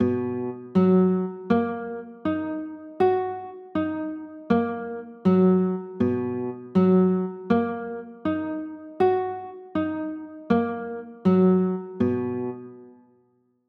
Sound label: b maj7, chords